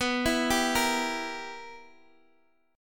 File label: B Major 7th Suspended 4th Sharp 5th B down Guitar Open G